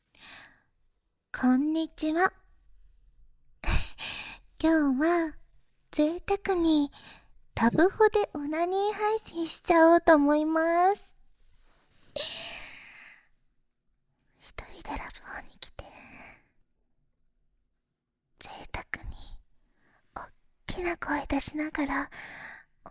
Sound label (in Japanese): 思いっきり喘いじゃうトロ甘オナニーボイス